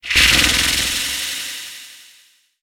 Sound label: fear cast, weapons; dota; dark willow